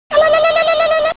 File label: ALALALALA; cstrike